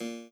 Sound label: chord